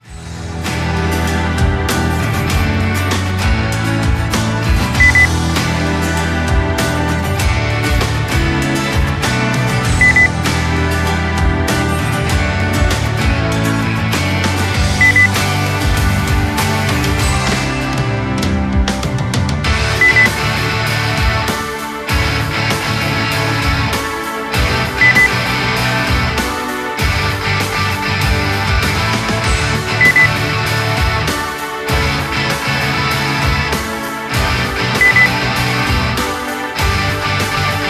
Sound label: rock slider beep